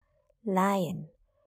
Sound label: lion; domestic and wild